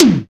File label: hi tom drum single hit c key, Royality free tom one shot tuned to the C note.